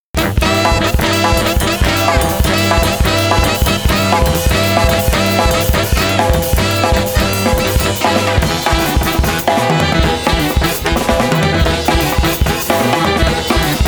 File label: Sax